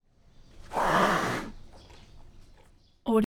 tiger hiss; prosoundfx

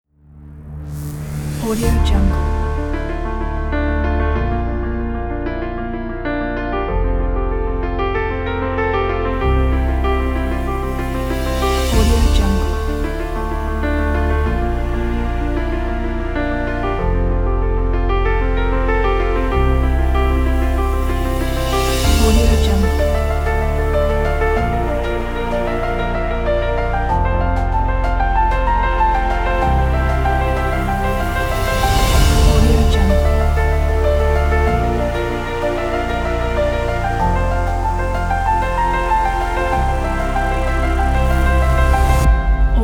romantic epic

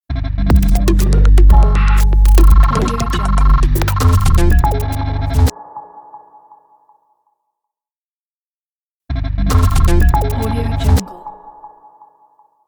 glitch digital logo; centralperkproduction

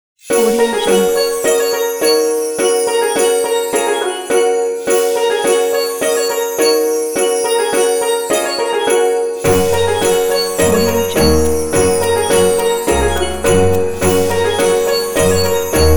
chinese new year

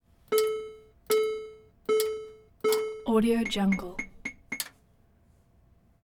slot machine

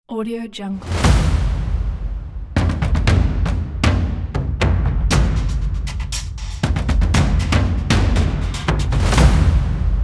epic industrial percussions

